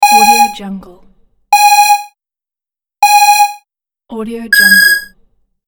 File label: 8-bit countdown